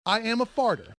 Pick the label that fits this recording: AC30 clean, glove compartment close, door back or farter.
farter